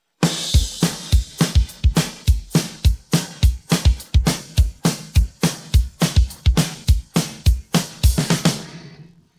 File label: drums pattern